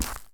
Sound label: step, dried ghast